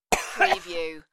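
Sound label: Check Cough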